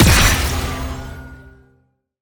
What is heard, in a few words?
Attack Jump Heavy; bloom minigame